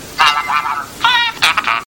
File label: robot ringtone; iphone 3g; iphone 3g ringtones